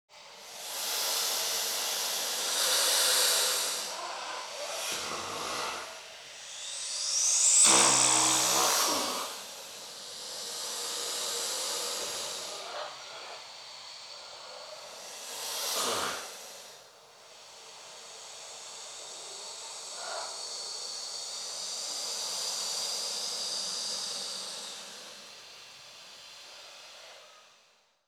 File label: Animals Birds; Sound Ideas 6003